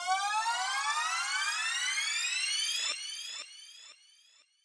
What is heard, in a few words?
oldschool synth climber